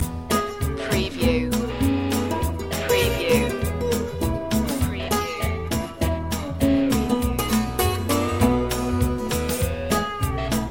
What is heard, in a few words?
country slide loop